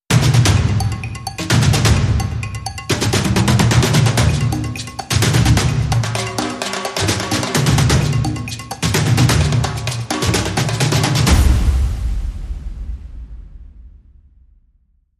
Afro Cuban

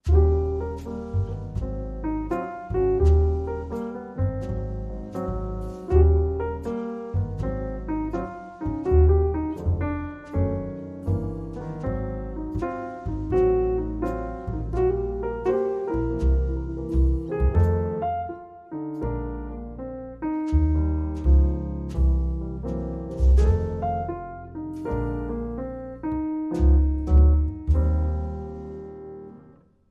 With Trio

